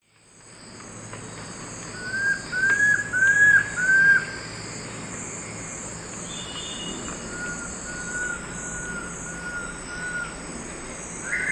Cymbilaimus lineatus, duet; S. America; Eastern Lowlands

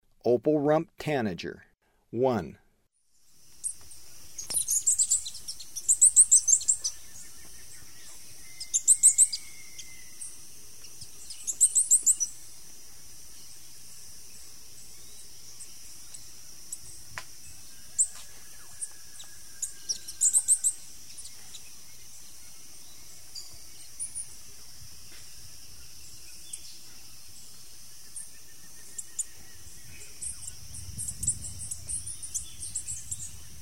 Opal-rumped Tanager; S. America; Eastern Lowlands

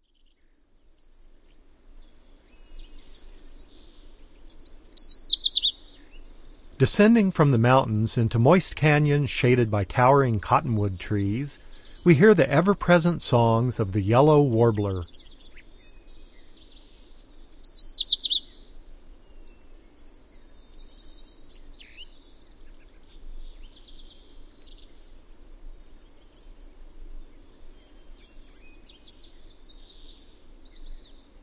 Yellow Warbler, Bird Sounds; North America; Common Western Species; Know Your Bird Sounds

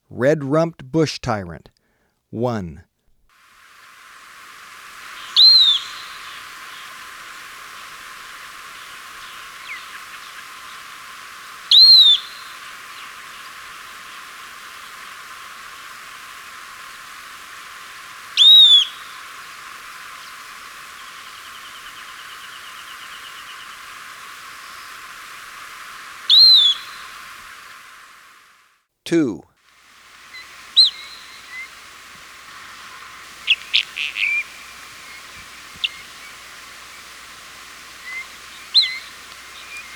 Red-Rumped Bush-Tyrant; S. America

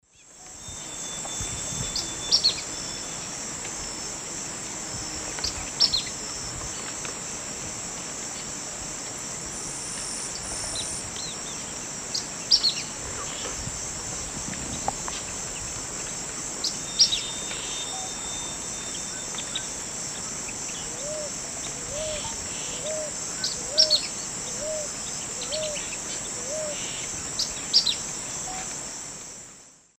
Serpophaga hypoleuca, song; S. America; Eastern Lowlands

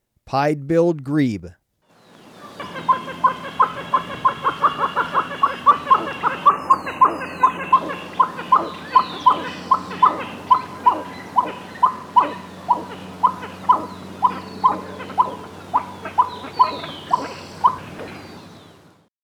Pied-Bill Grebe, Birds of Ecuador; S. America

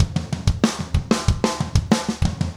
rock toms 90

